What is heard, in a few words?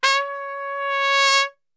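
C#4 trumpet; Best Service; Brass Super Section; TRP SWL; 90 s Sample CDs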